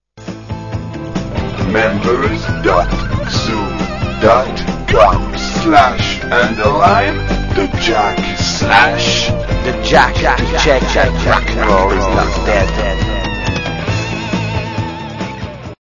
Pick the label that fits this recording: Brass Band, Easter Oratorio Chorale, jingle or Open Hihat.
jingle